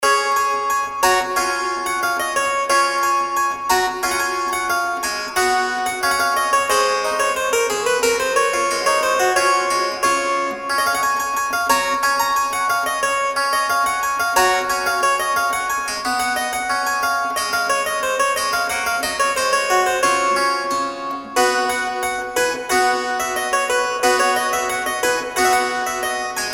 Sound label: spinet; dowland puffe